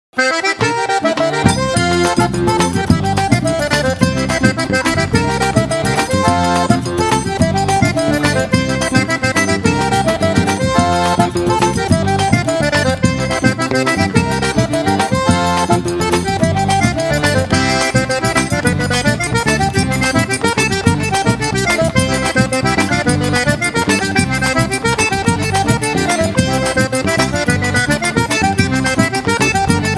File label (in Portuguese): Forro; em Cacimbinha